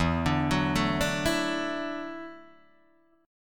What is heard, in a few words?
E 7th Suspended 2nd Suspended 4th, Guitar, DADGAD